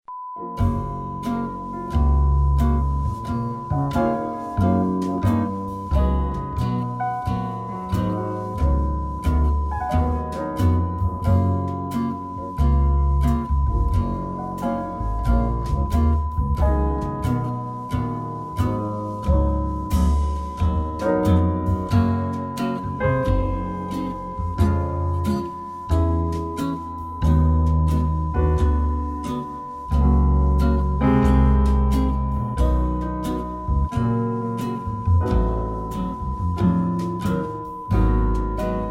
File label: cheerful time